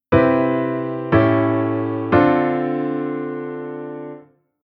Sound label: Chord dim7